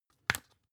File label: spice jar open